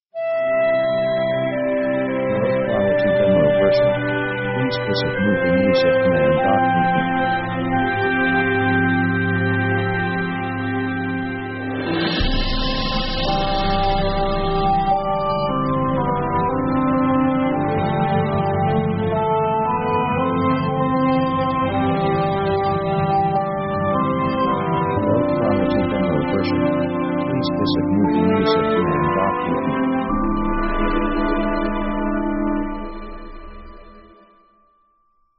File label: orchestra romantic italian; orchestra themes